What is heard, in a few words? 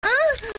Moan